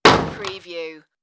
light room door close

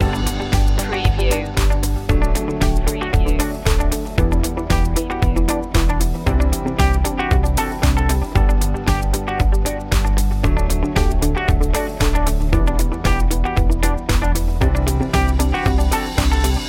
easy corporate loop

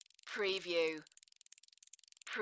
Insect Legs Walk; Sound Effects Bible

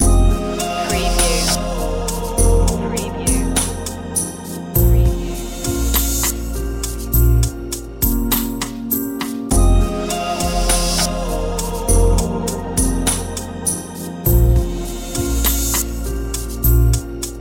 singing spirit

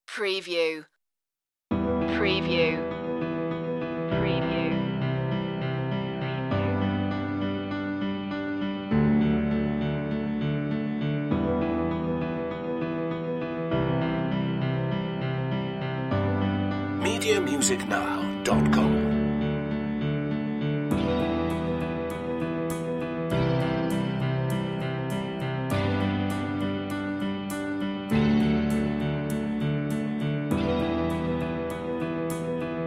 finding home no strings

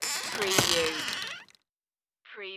creaky wooden hut door

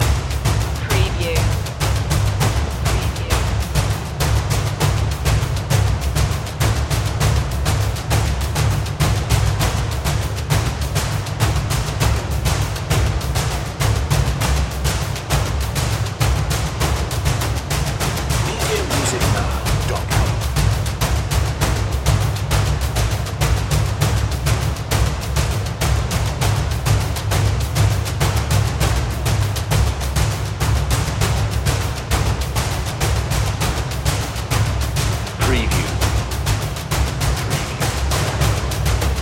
all the way to heaven perc loop

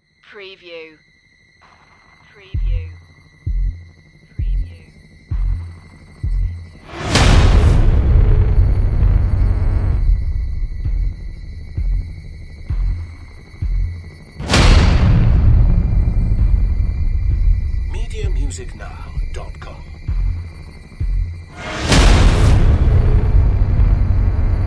horror trailer first part only

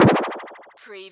CARTOON TYMP WARBLE

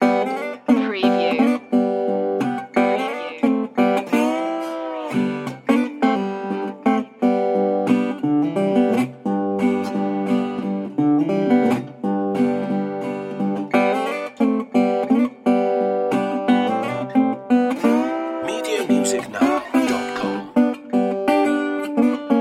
blues loop

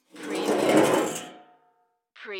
Folding Chair Slide

